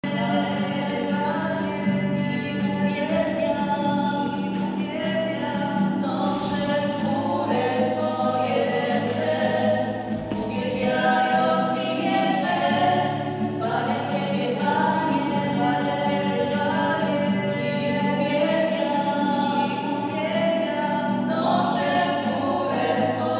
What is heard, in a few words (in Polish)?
schola